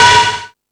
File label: Found Sound, E-MU Pro-Cussion